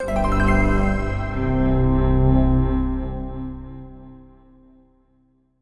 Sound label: UI Level clear